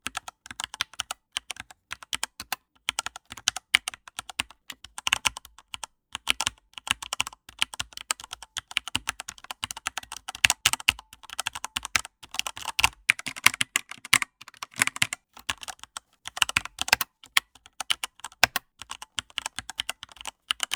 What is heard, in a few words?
Computer Keyboard Typing Snippet Mono Loop